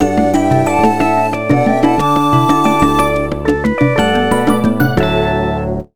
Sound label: Sting Level Complete; SimAnimals Africa; NDS